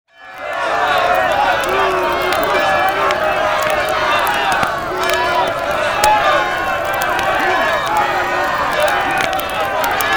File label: witch mob